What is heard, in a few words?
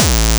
Bombo Hardcore, kicks